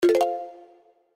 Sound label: Spin Button; slots; Crazy Rockets